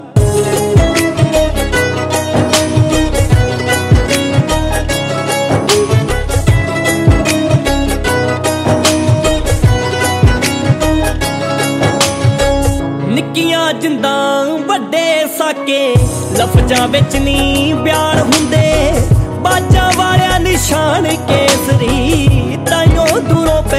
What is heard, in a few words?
punjabi music ringtone